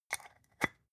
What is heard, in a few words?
spice jar scratch tonal close slow; industrie wirtschaft; gastronomie